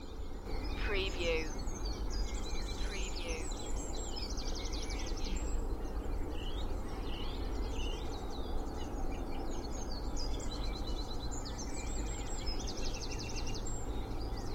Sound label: Spring dawn chorus with noisy distant traffic noise Cheshire UK; 6mins; beanstalk audio